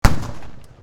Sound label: canon fire; Pirate Games